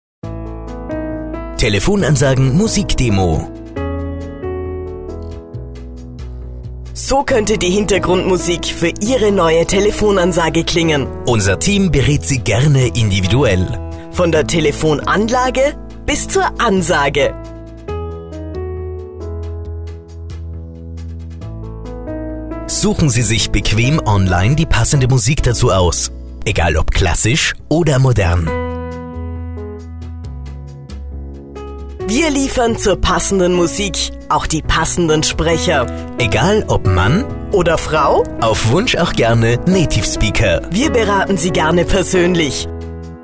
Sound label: Slow